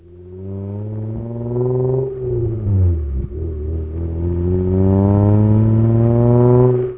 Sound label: car